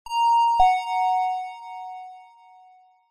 public places pa system tannoy bell electronic chime dry